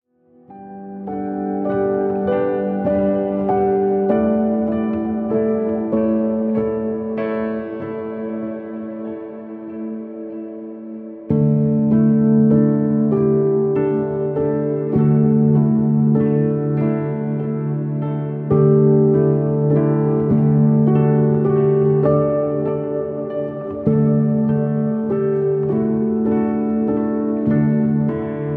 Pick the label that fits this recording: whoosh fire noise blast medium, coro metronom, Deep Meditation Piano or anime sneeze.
Deep Meditation Piano